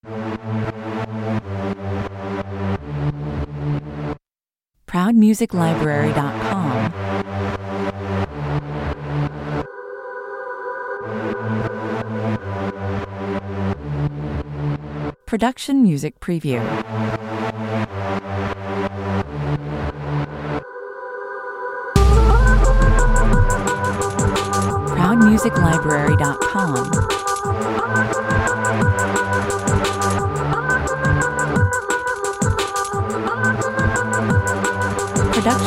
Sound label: digital siren